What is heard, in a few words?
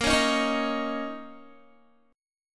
A sharp Minor Double Flat 5th, down, Guitar; 4F,4A,5C,5G,5C,6E; strummed